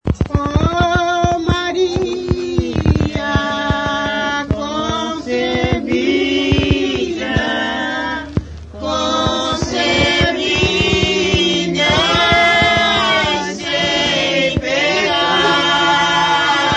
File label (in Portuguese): Ladainha reza cantada terço